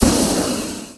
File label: jump; Nintendo